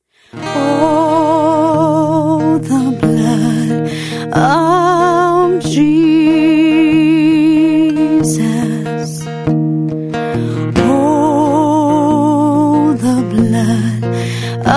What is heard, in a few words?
tenor